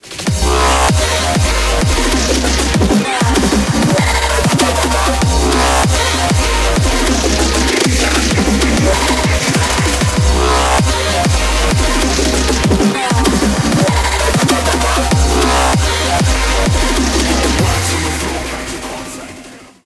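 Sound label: background music crossfade; Invaxion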